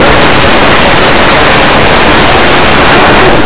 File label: gear